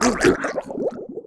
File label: DROWN